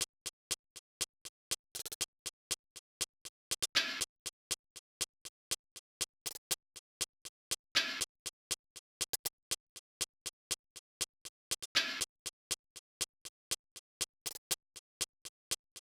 Dirty Tops 120bpm

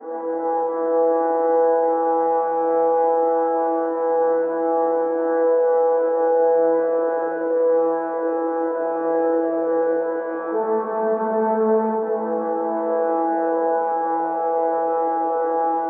Isolated Strings 160bpm Emin

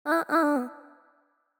nuh uh melody autotune

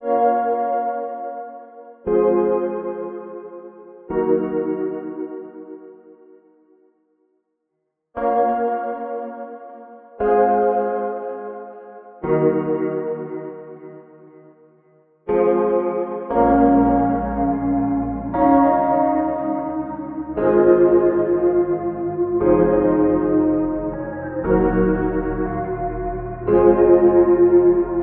Melody 118bpm Bbm

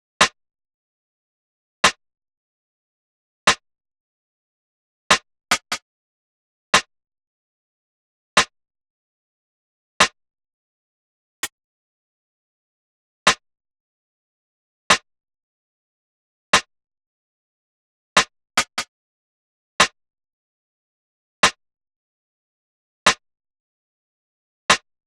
Snares 147bpm; Loop, WAV

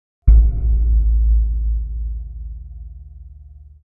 Deepimpact FX; Loop, WAV

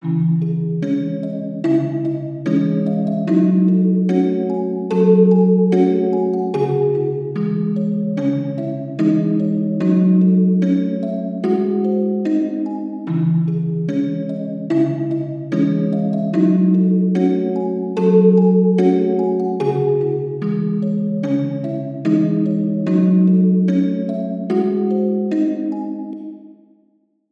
Diamonds Melody 147bpm C m

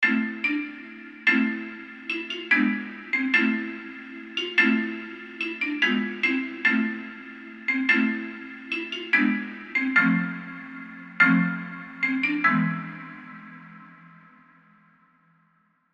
Bell Magical; trap vst

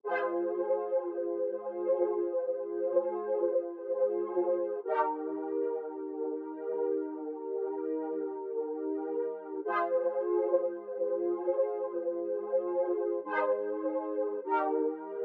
Clouds Melody 100bpm F min

